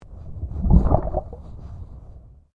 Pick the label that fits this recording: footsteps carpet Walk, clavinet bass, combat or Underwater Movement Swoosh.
Underwater Movement Swoosh